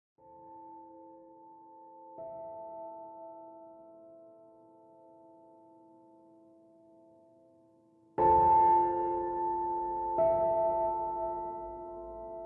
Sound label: Orchestral